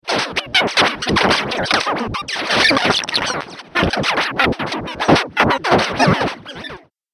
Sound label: Haywire Spell, magic; Magic Sound Effects